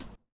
KIT, Dance Mega Drums, HIP; Best Service

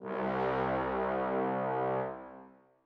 trombone tenor leg C2, Brass; BBC

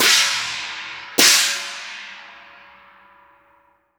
DA BA, CHINESE PERC; Spectrasonics; Heart of Asia

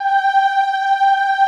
OHH G4, Classical Choir; Best Service; Extended Classical Choir

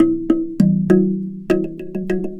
Box Drum; Jade; Sonic Foundry (Sony Creative Software); Ma Ja Le Ethereal Textures; Worldly Rhythms

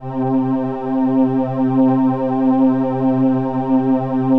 LAMBDA, String Machines

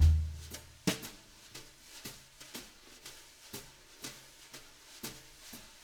BRUSH LOOPS, Living Drums

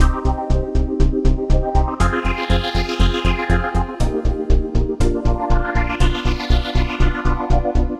Rising Mix, Funk; Sonic Foundry (Sony Creative Software); Dr. Fink's Funk Factory; Complete Loops

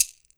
maraca; E-MU Producer Series Vol. 7 – Old World Instruments

